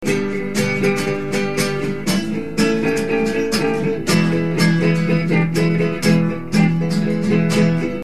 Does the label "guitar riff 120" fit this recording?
Yes